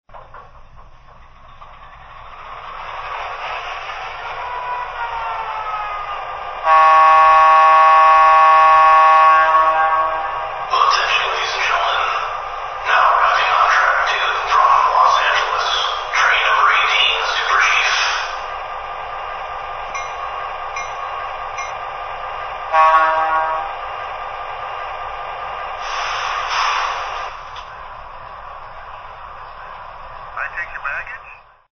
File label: F3, trains